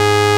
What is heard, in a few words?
P6 SAW; INIS; Vintage